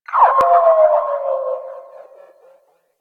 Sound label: Robot Metallic Textures, Ambience; Warframe; Venus Open World; Objective Rooms